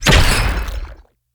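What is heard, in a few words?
ancient sword, impact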